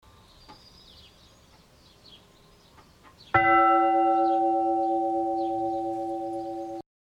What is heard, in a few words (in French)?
cloche; Fauillet